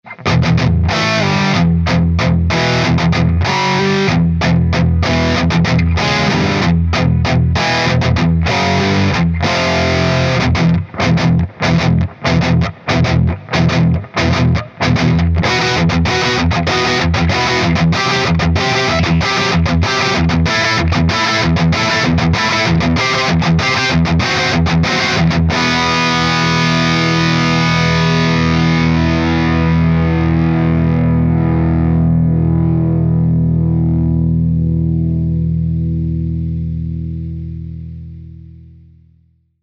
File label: heavy riff; parker; twonotestorpedostudio